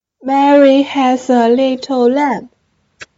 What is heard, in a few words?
sing voice